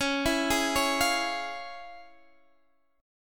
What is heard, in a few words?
F Minor Major 7th Sharp 5th, C sharp